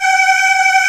CHOIR G3; AKAI S-Series CD-ROM Sound Library VOL-2

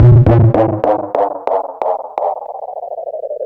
bass delay fx; EZRollers

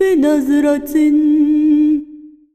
SINGER, ArabianTraditions

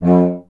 tuba; Miroslav Vitous - Solo Instruments VOL-2

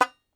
Tenor Banjo, Plucked Strings

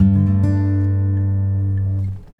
Bug Bug Stop Gtr, Guitar Only; Sonic Foundry (Sony Creative Software); Friendly Fire

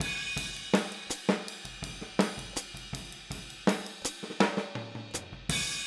Boogaloo Fill Hi-Hat, 82 BPM, Drums

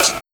808 Snare, Kit; Sonic Foundry (Sony Creative Software); Esoterik Beatz

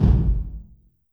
LR K 808, LARGE ROOM 808, REVRB TR 9+8

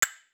CASTANET, Drum Machines, Akai MPC-3000

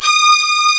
G#5, STRGS; East West; Global Sounds

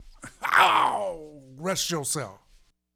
Vocal, 70s Analog Funk; Sonic Foundry (Sony Creative Software); What it Is! 70s Analog Funk

